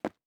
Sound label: Footstep Concrete Running